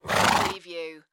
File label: Horse Snort; Sound Effects Bible